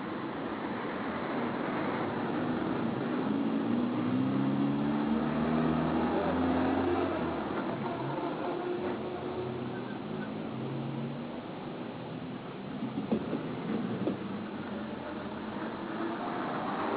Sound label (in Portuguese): ruas